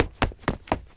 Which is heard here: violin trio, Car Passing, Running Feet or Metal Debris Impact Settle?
Running Feet